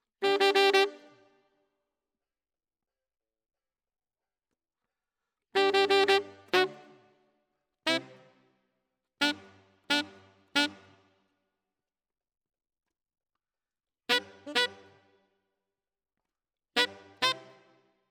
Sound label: Alto, RNR for piano